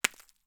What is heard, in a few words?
impact small; BNG Framework